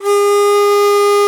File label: BON DI, Winds, Old World Instruments; E-MU Producer Series Vol. 7 – Old World Instruments